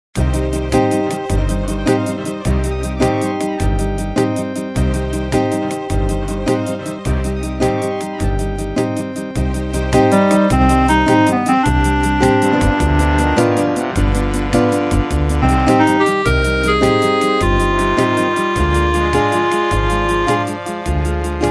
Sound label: Tango triste